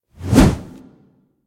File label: boulder throw; Fate The Cursed King; SPELLS